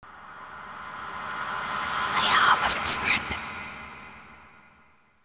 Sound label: reversed; slender